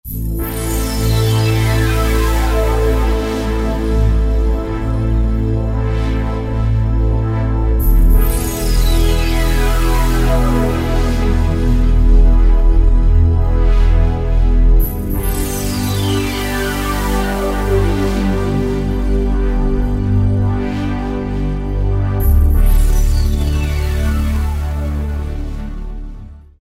Roland JD-990; Factory Patches; Deep SEAmphony

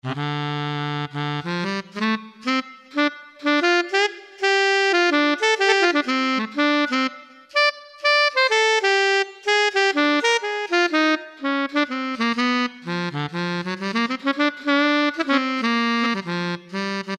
Tenor Solo; Factory Preset examples

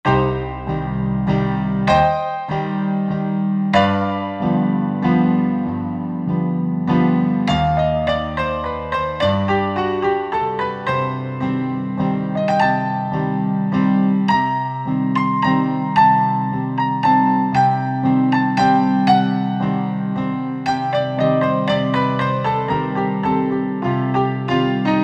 Upright Pno

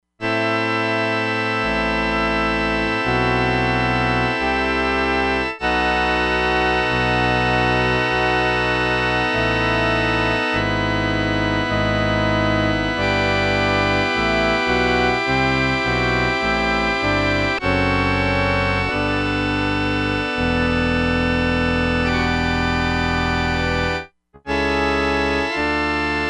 CHURCH ORGAN; Crumar Bit 99; Factory Programs

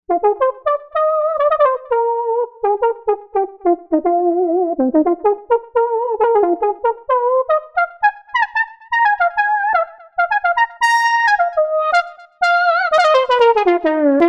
Soft Lead; Novation UltraNova; Factory Patches

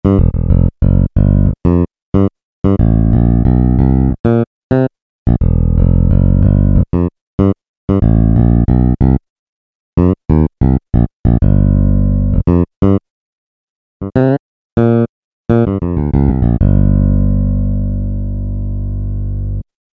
Round Wound, Peavey DPM Spectrum Bass

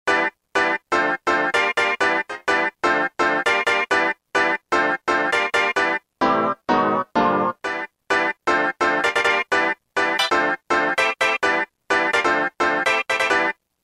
Organ Hits; Roland M-DC1

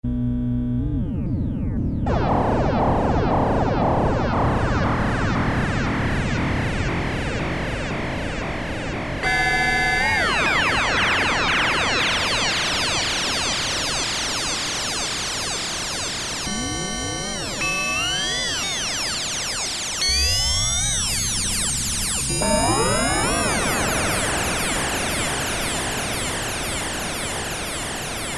KLINGONS, Roland Jupiter-8, Factory patches

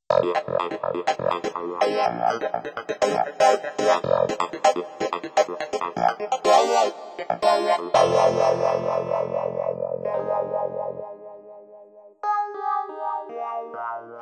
clavi, Yamaha PSR-530

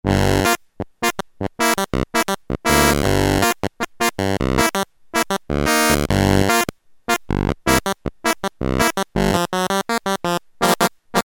Clavi, Korg PolySix; Factory Presets Bank A

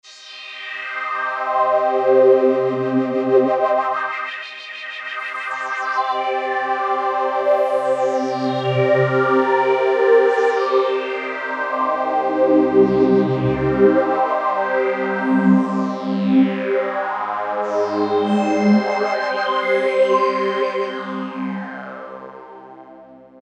Synthex, Generalmusic Equinox

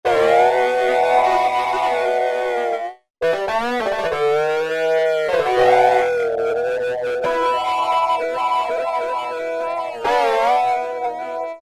Gtr Fuzz Tone, E-mu Morpheus; Factory Presets

